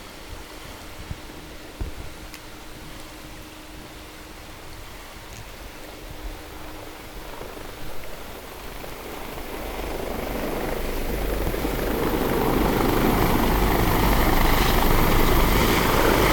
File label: NoShip, helicopter